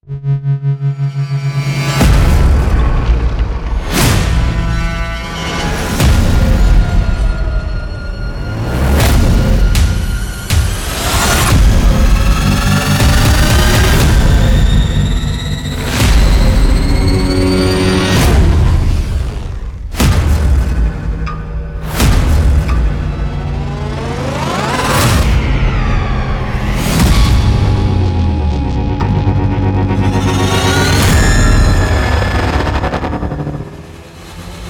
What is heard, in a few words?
Trailer Toolkit; Field and Foley; Modern Trailer Toolkit Vol. 2